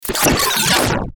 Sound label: Stinger Digital Beep Power Down; Production Element Imaging Element Accent Transition